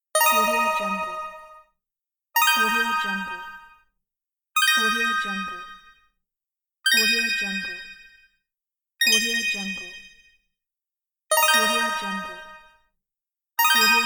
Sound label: congrats arpeggios; audioefx